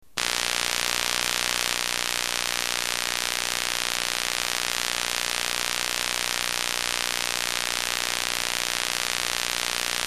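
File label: notebook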